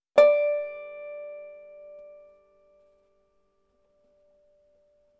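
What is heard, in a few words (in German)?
flageolett toene, banjo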